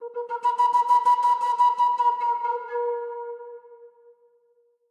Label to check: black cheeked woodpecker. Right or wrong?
Wrong